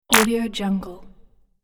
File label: pixel simple single hit